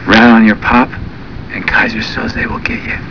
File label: pop